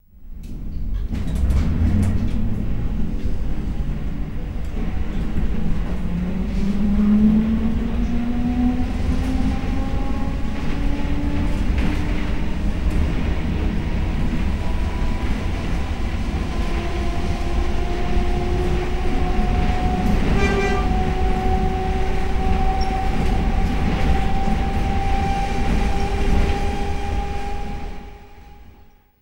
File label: Elect Bus Away, electric bus